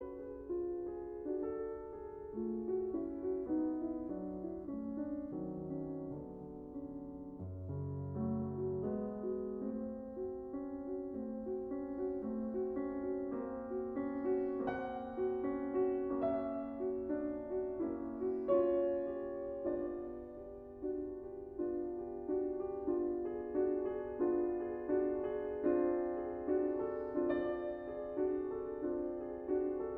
Largo in F sharp minor; Rachmaninoff

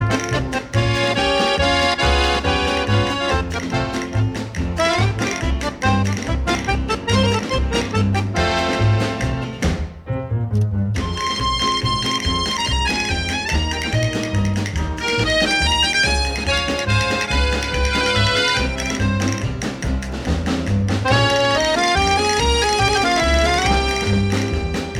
Polka

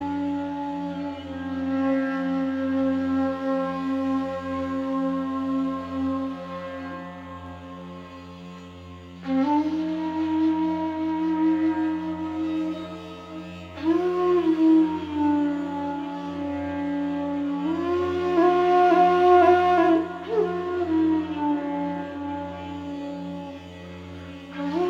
Indian flute, World music from India

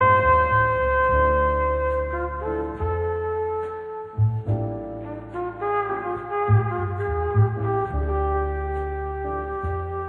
Quartet

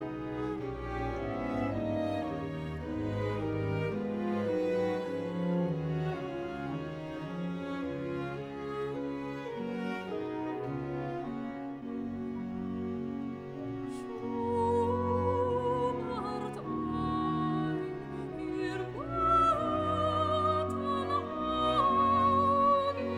Aria Soprano

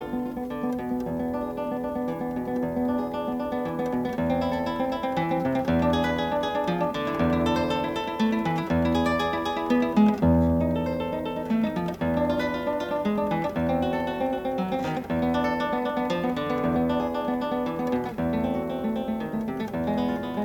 Prelude for Lute

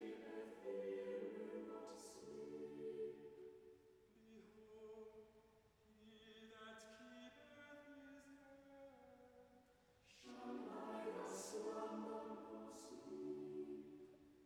Choir; gemengd

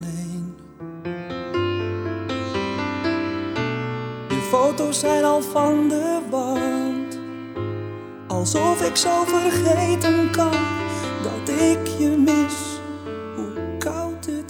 duet

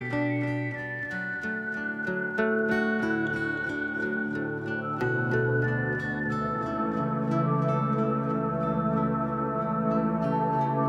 Whistle theme

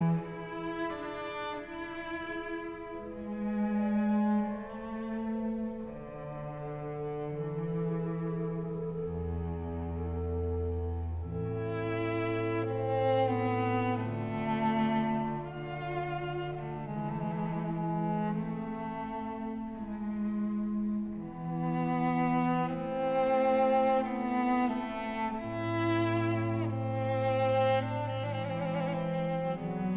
Largo Cantabile Concert in A minor; Vivaldi

